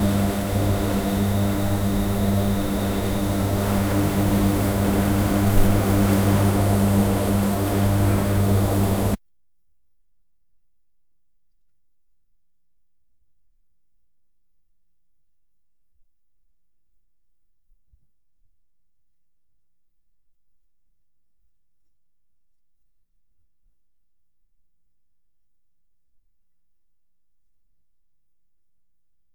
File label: sound of weak light bulb, shop